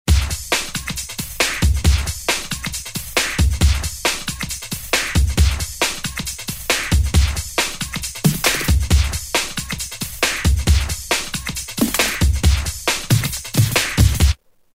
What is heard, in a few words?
New Jack 136; Roland M-DC1